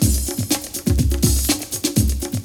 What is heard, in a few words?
LUSH LOOP, BREAKBEATS; Crypton - DrumBase5000; BREAKBEATS#2